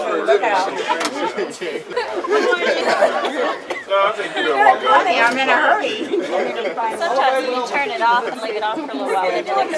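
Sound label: crowd; Mafie 1 cz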